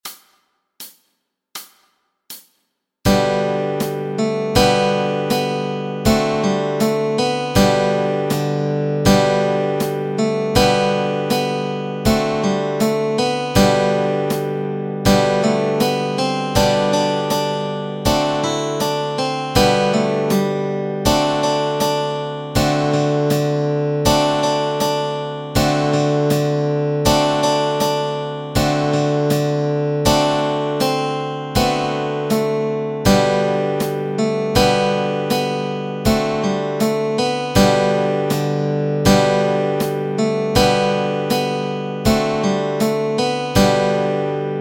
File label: Aloutte Melody and Chords Track